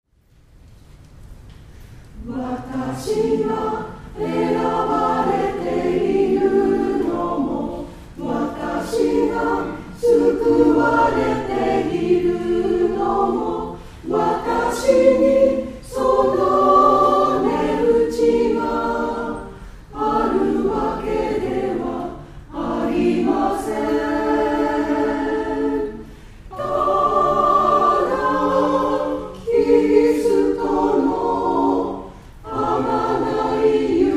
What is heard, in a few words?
choir